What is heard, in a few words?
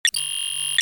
padd